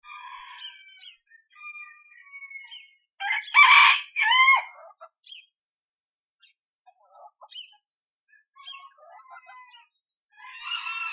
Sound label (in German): Huehner